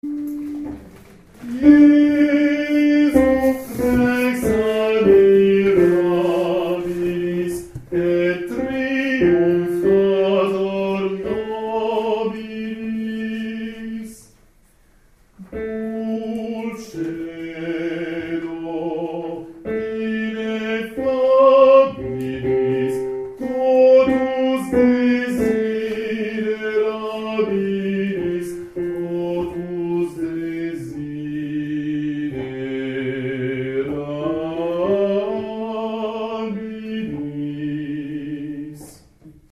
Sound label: JESU REX Baritoni